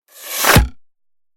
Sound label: miss, combat, ranger; A succubus is born